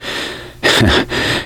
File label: laughter, survivor voice; gambler